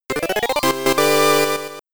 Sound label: Power Up (SCC); Knightmare; MSX